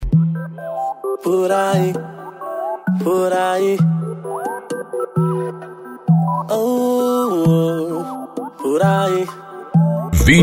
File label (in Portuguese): funk e rap